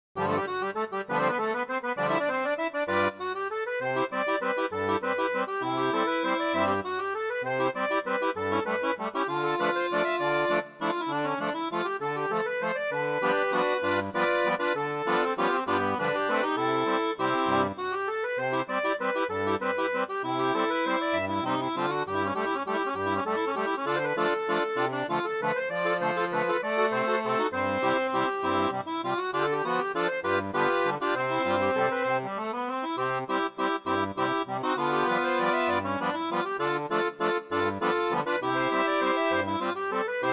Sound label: seraphone